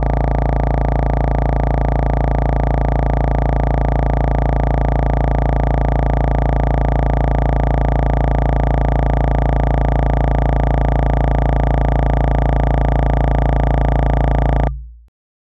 Thick Bass; 808

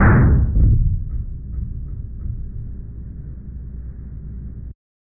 Bitch Slap; SFX

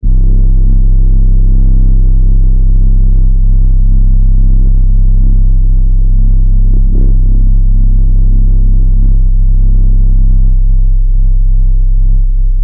Wierd Reese, Bass; 808

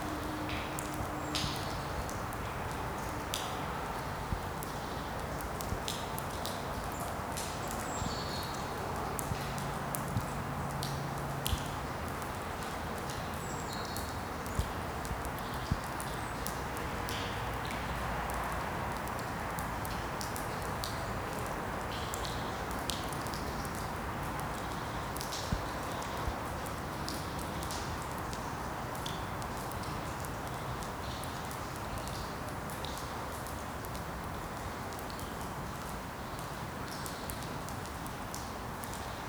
Rainwater Dripping; SFX; IRL Recorded; Rain - Thunder

